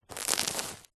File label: food bread baguette tear or crush; zapsplat